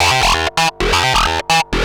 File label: Analoop, Industrial